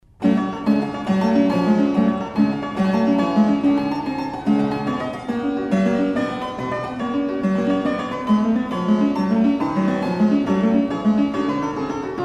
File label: grand piano 1767